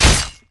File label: beat